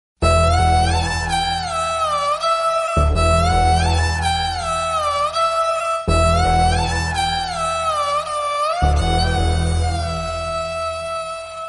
romantic violin

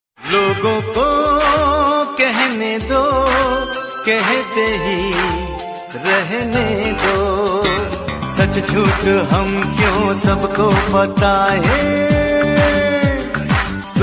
old hindi song

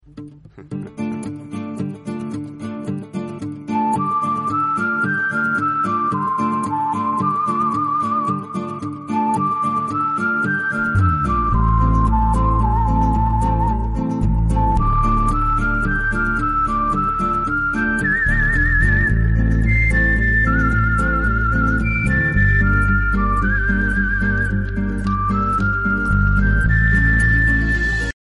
businessman flute

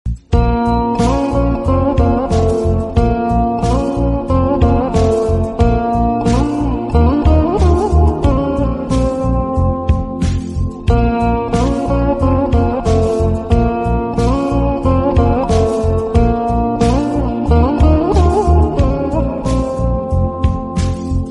instrumental

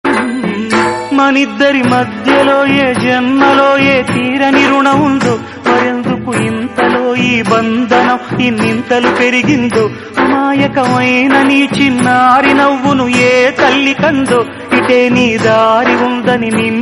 old telugu song

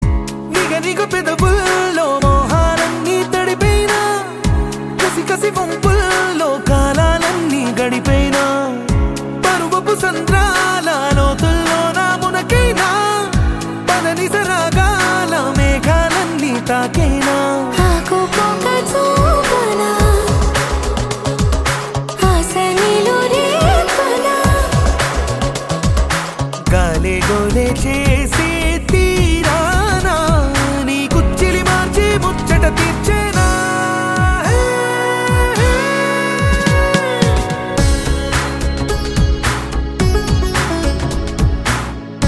sitar song

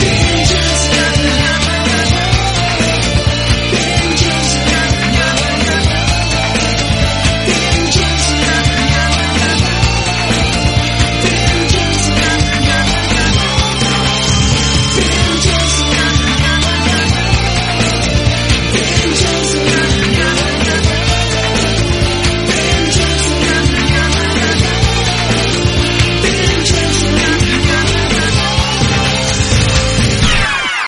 love tune, ringtone

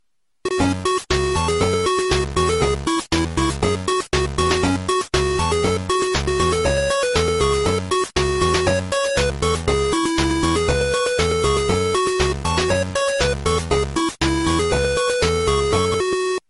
levan polkka remix, ringtone

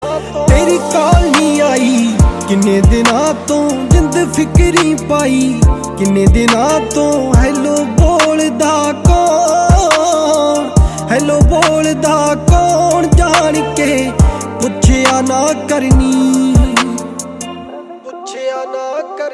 call

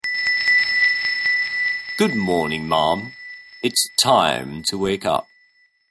good morning cool tone

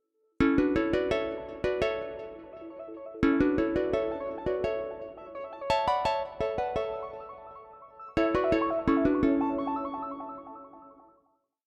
samsung, ringtone

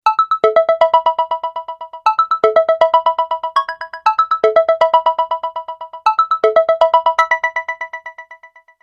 samsung shimmer

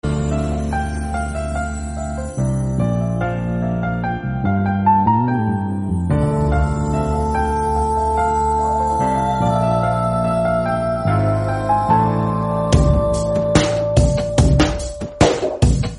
soft love

